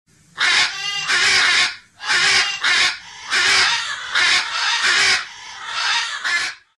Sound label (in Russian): Карканье